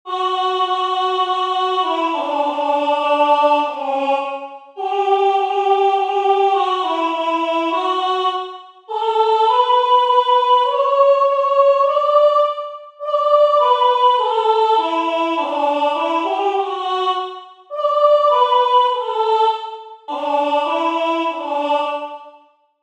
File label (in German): musik